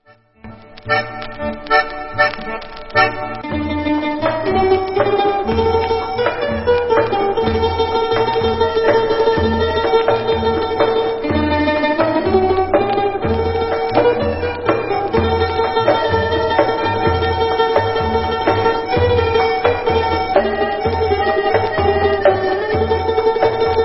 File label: Instrumental